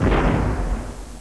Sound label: Cannon